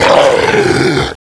zc infect; zmall

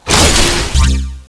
tesla attack activated; zmall

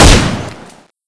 robotix

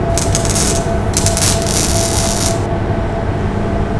guard loop, scorpion

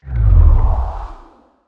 turret mode change; zmall

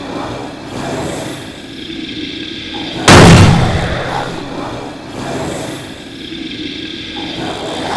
idle, scorpion

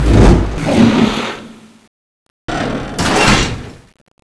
storm down

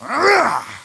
attack roar; Event Bosses; Illidan

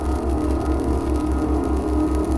turret idle pull pin spark, weapons; zmall

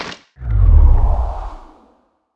turret draw; zmall; weapons

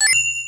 grab; zmall

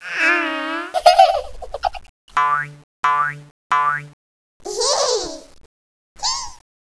zombie gun idle; zmall

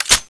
crossbow clip in, weapons; zmall

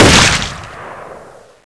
coil; zmall; ghost

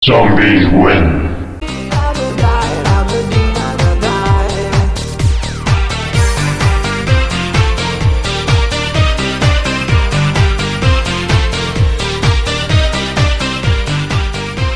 zombie

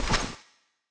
turret throw, weapons; zmall

